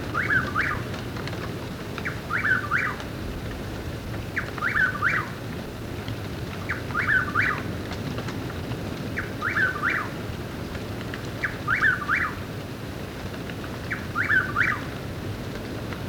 WHIPPORWILL; BIRDS

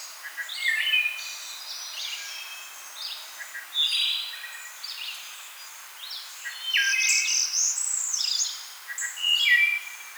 may thrush

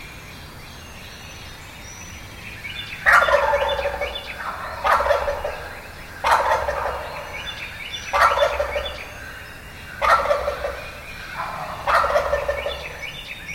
march turkeys

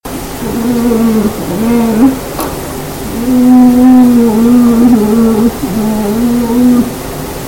bear

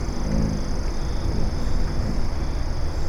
hummingbird wings

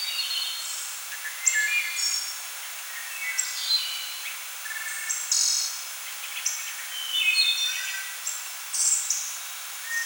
Wood Thrush Chorus; BIRDS

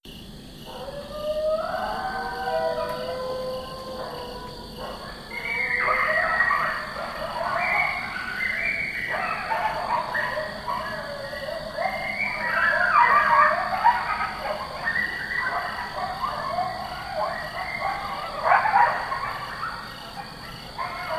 coyote territ

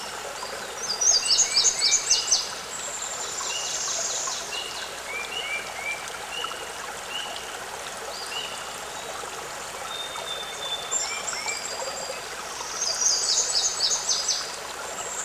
woodland chorus; BIRDS

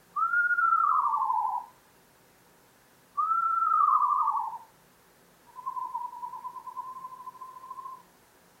screech

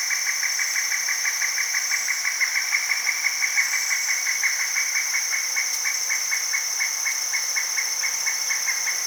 pileated web best

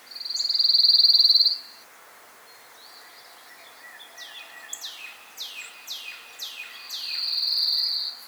dark eyed junco

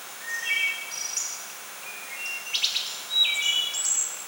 wood thrush; BIRDS

